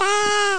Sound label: baa; worms